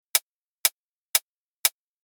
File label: hat